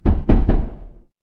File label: door knock